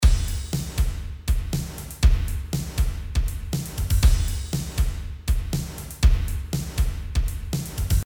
Drumloop smart EQ; Cubase12

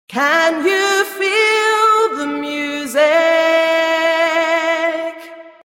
SX 2 wet; studiodevices

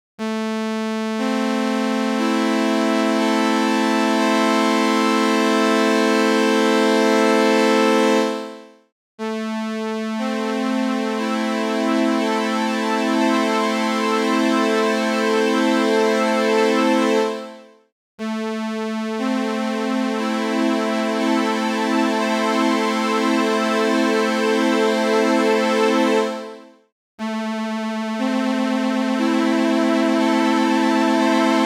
CHORUS JUN 6; ARTURIA

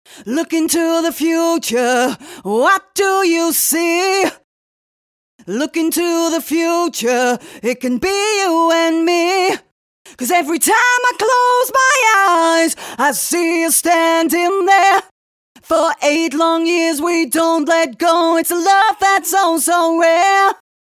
Autotune EFX Retune Speed Humanize; Antares

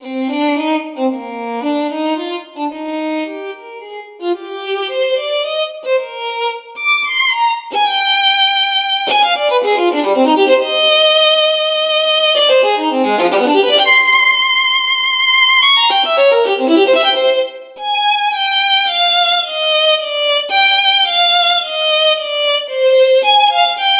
Violin; Brahms; Chris Hein

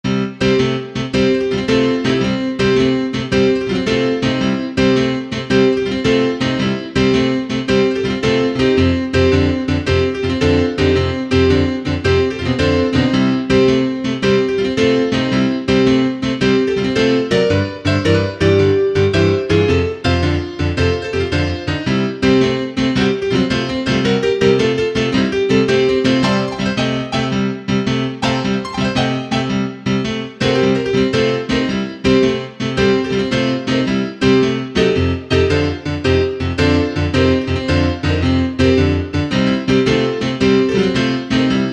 GM Piano WET; SFZ FLUID3

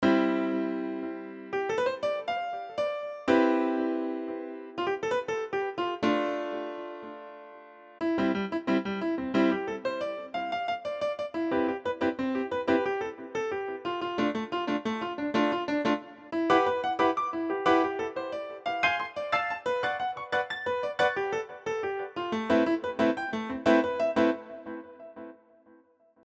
Piano Jazz Cycle

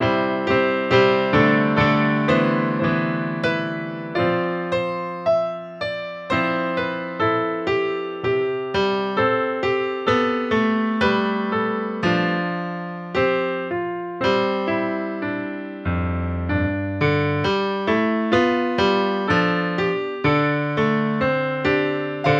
EZKeys Grand

